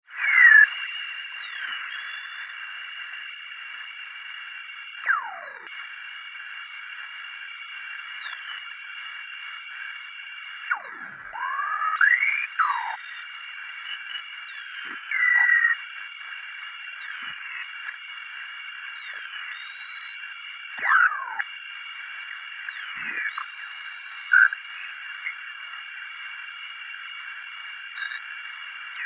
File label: Alien Atmosphere Scifi Ambience; Sci-Fi (Sound Effects:Science Fiction)